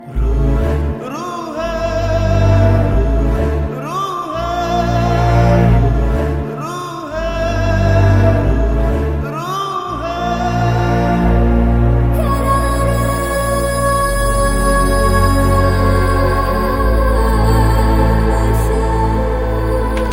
Love BGM; Sufiyum Sujathayum

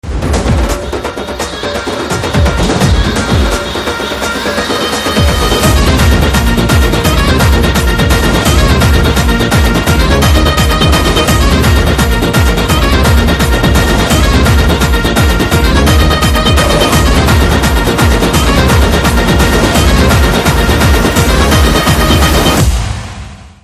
BGM Drum Beats Ringtone; RRR

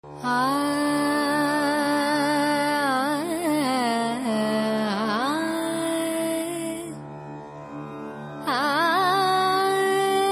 Humming

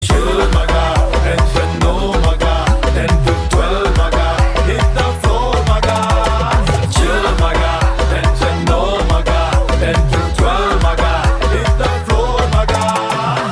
Chill BGM Ringtone; Saturday Night; Nivin Pauly

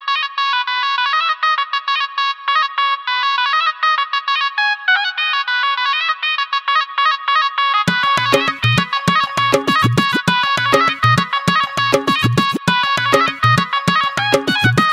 instrumental

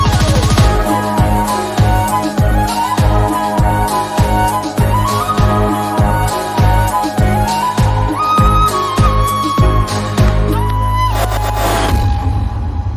Love Flute BGM Ringtone; Mugilpete Teaser

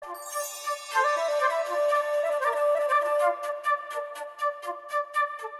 Trailer Love BGM; Malayankunju

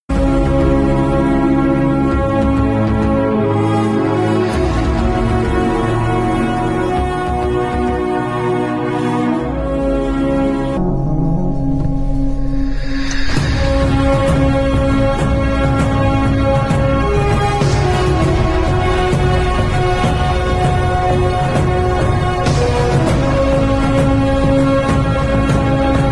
Malayalam Intro BGM; Forensic; Samuel Kaattookkaran

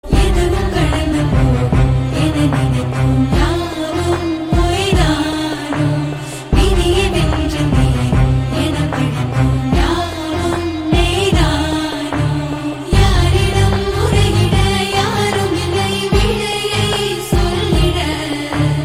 Female

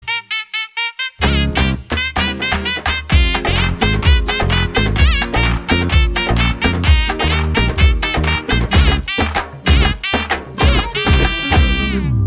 Drum Beats

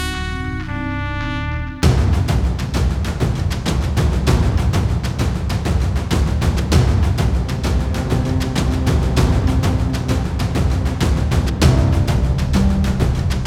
Theme BGM Ringtone; Sathru

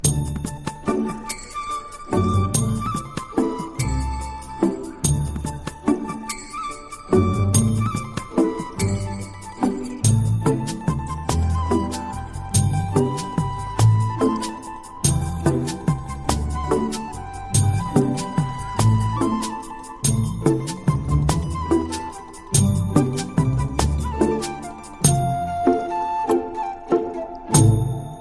flute; Atrangi Re

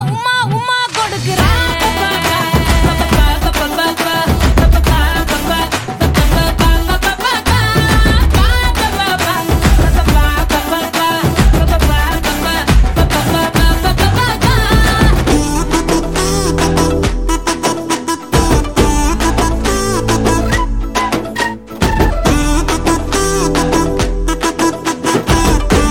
Humming Ringtone

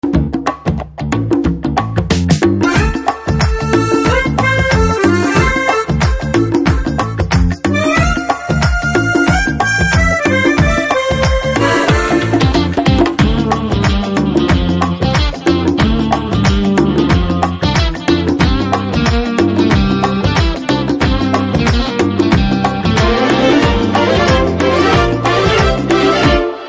Djembe Ringtone

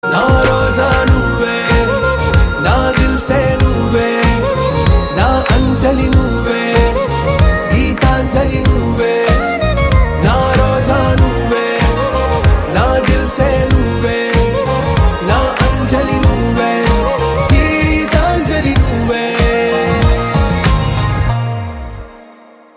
Humming; Kushi

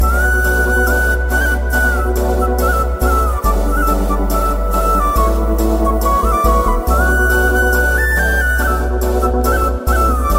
BG Flute Tone

